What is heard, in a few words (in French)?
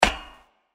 Footstep passerelle metalique; Blind Survivor